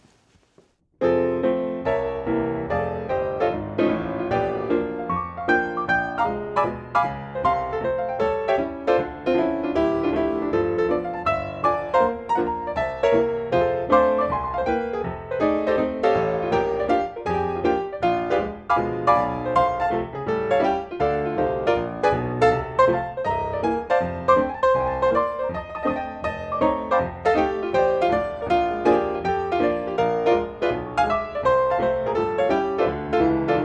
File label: Gospel piano Old style Ragtime style